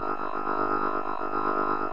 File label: drone; Undertale